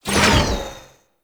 Mirror Defense Rune Shot Cancel; Ambience; Gameplay; Warframe; Entrati

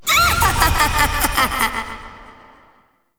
Corruption UI Haunt; Warframe; Zariman; Missions

